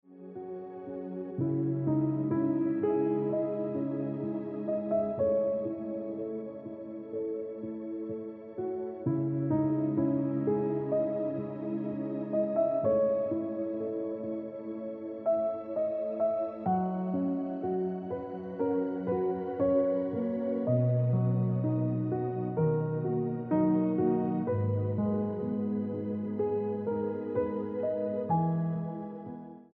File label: Piano Music